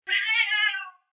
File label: msn cat